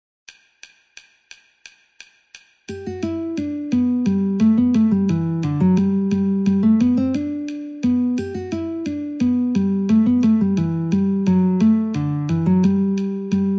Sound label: Guitar